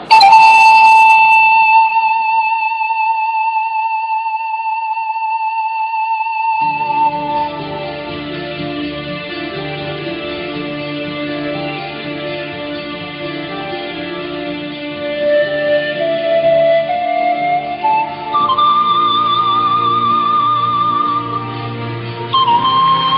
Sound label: Best Flute Ever